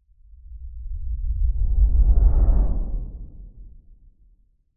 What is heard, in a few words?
sound design whoosh slow rumble; zapsplat